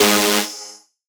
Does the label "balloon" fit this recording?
No